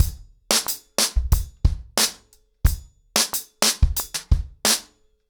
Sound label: ACOUSTIC DRUMS, 90 BPM